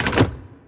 Lever

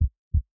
Logo heartbeat

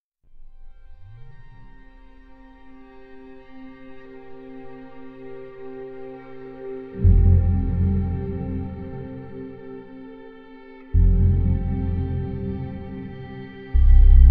Loop 25 Bar 100 Bpm; Protopulse Test 4b

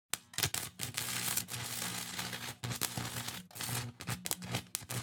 factory boss cutscene Sparks Loop; enemies

